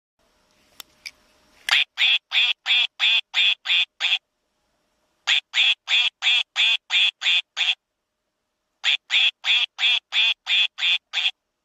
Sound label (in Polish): Odglos kwakania kaczki